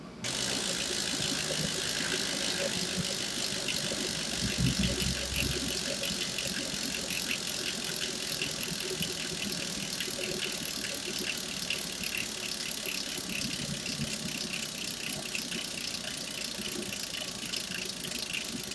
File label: Bicycle Wheel Rotation